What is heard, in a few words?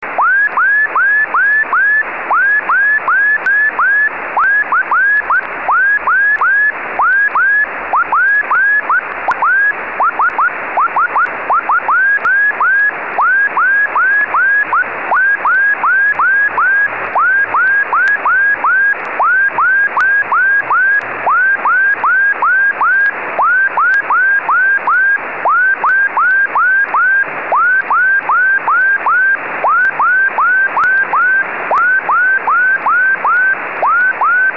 compass1 beacon